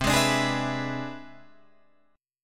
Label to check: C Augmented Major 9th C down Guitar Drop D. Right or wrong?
Right